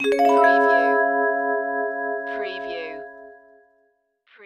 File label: Music Vibraphone Chord